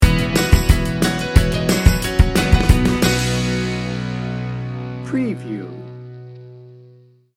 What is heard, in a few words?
americana sky sting bumper